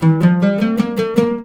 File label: LUTH D, Arabian Traditions, LUTH RIFF; USB Soundscan vol.43; AKAI